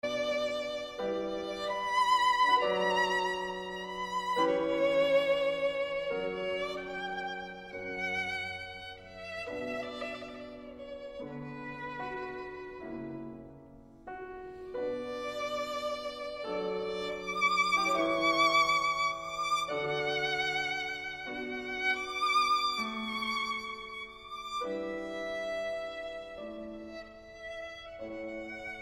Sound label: Violin Sonata No. 7 in D Major III. Larghetto